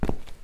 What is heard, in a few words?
Step